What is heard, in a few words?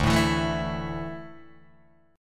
D Suspended 2nd D down Guitar Open D